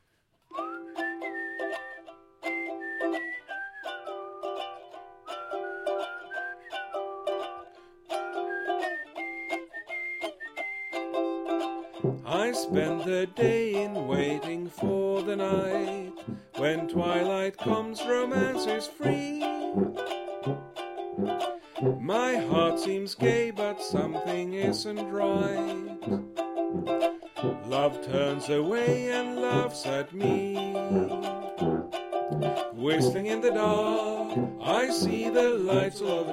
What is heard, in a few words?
whistling in the dark